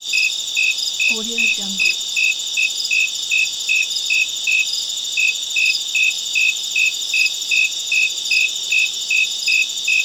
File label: insects night forest